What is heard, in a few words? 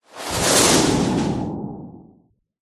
magic dark spell cast powerful wind burst eject; fantasy